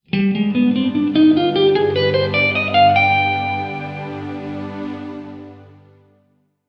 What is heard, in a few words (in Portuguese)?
Escala de G Mixolidio b9 b13